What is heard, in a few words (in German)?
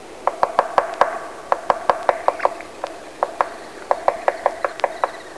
buntspecht, singvoegel